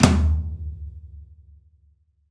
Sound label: Pop Flam Tom, Roland BK-5